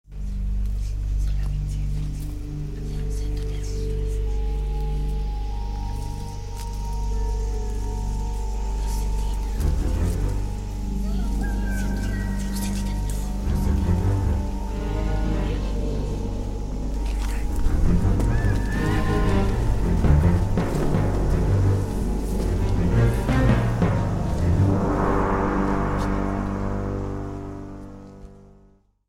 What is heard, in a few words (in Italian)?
suspense